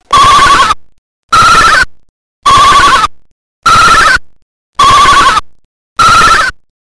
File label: sheep